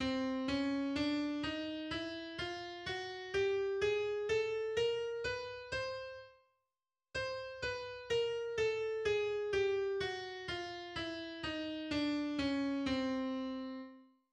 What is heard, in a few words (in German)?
Tonleiter c chromatisch